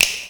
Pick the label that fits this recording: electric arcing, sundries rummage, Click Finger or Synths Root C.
Click Finger